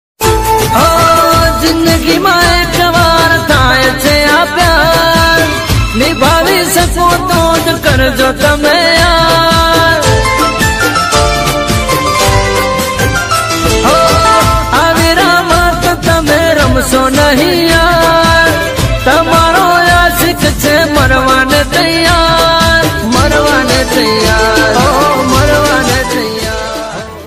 gujrati ringtone

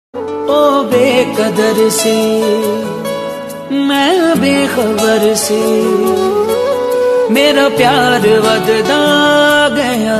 punjabi ringtone

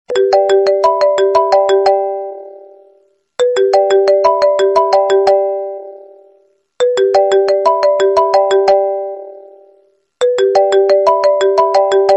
apple iphone 8 ringtone

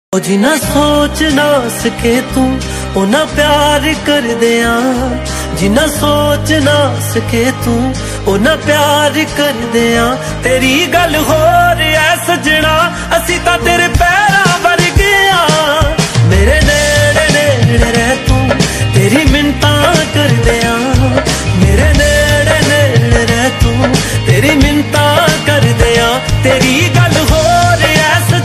new punjabi ringtone

